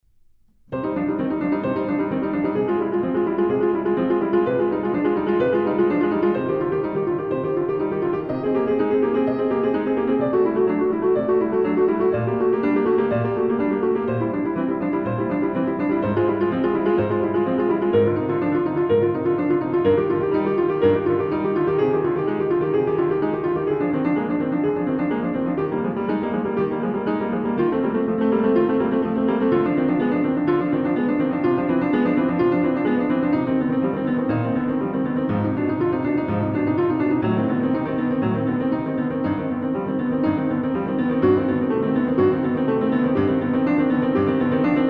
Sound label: Prelude and Fugue in C Minor; bach